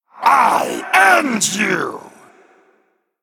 Male Kobold Attack

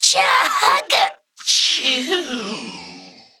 Death

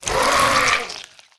Swamp Leech Attack

